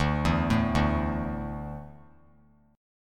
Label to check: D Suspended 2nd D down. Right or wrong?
Right